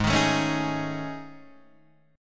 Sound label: A Major 7th Flat 5th